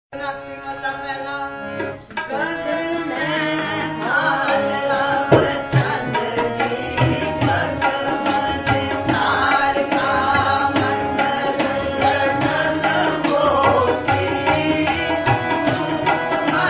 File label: Punjabi